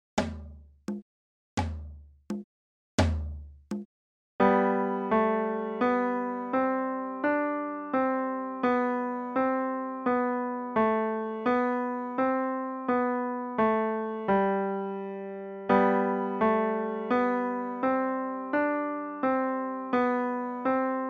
Musette Eng Suite left

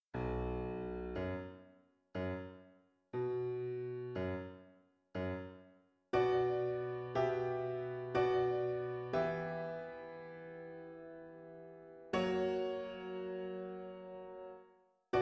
Piano

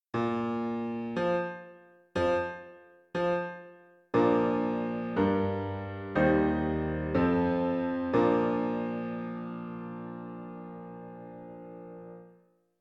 Piano

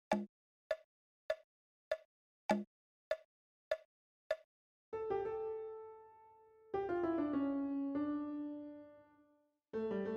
Toccata Fugue

